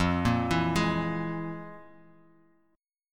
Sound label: F Minor Major 7th Double Flat 5th, F, Guitar, Open F; FmM7bb5 arpeggiated; 5,5,4,3,x,x